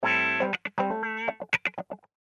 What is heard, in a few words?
Wha Wha Original